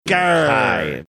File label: grrr